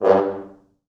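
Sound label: 4 French Horns, Horns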